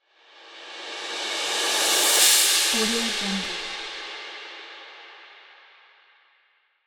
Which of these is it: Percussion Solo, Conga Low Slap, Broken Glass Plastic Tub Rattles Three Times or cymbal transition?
cymbal transition